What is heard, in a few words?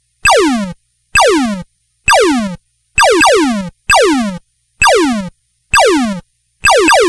Game Boy FX Laser Toms Loop, chiptune; Nintendo Game Boy